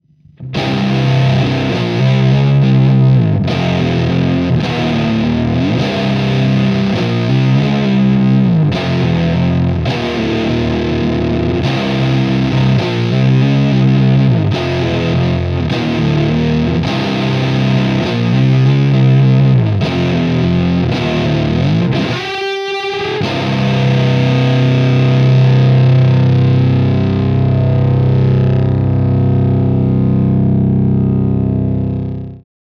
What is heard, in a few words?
GTR FLAT